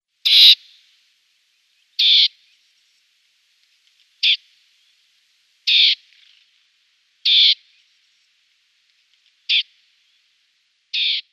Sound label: Dartford Warbler calls